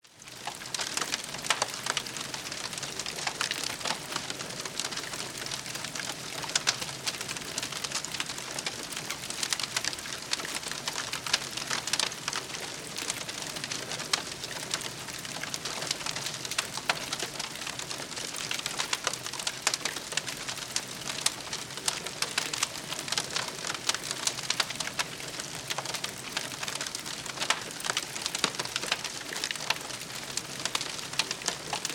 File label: Bonfire Flames Sizzling